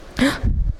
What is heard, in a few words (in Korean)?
헉